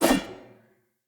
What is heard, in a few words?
Sphere Polearm Whoosh; Weapons; Player